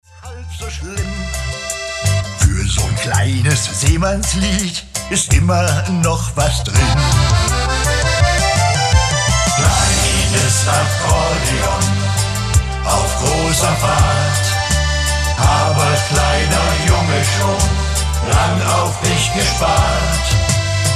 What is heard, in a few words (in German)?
Kleines Akkordeon